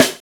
PAAH SNARE

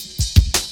Jazz Ride Cut, Drum Loops, 166.5; Jungle; Zero-G Jungle Warfare 1, 2 & 3; Jazz Ride B; 90 s Sample CDs

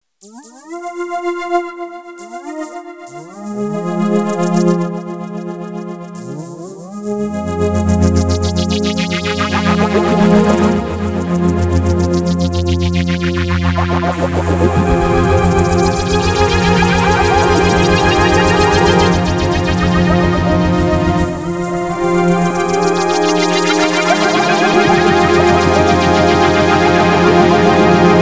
Flutter Pads, Electro Lounge; Sonic Foundry (Sony Creative Software)

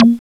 ZOW, ANALOG; FAIRLIGHT CMI IIX

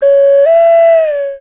BD CALL, BIRD CALLS; Voice Crystal - Steve Reid Definitive Percussion

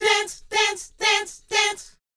LET, Vocal, R&B Dance, DANCE 120

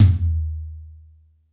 G2 - Rock Tom, Yamaha PSR-E323 Rock Kit

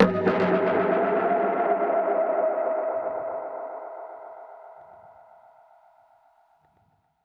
Perc Hit, dub percussion one shots; musicradar

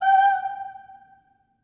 STACCATO G5, Symphony of Voices; Spectrasonics

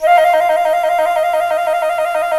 C flute trill

